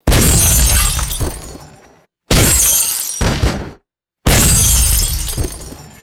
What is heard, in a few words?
small punchy glass debris explosions